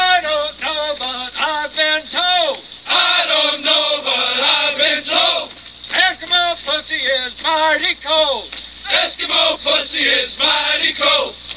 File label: drill